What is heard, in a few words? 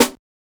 Snare Clapper, drums